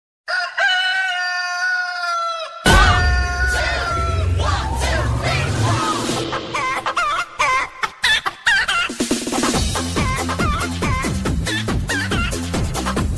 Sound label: chicken mix